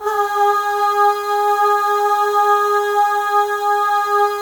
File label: Voices, FEM AHS; Spectrasonics; FEM.1 AHS